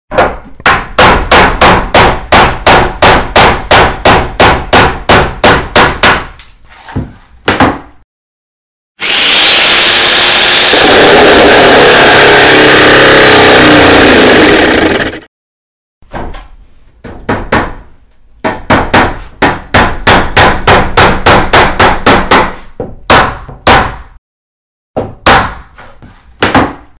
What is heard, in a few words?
nails saw